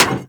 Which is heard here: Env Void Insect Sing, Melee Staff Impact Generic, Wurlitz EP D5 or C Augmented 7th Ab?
Melee Staff Impact Generic